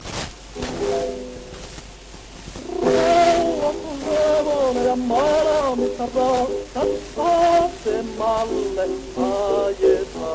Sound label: Gramophone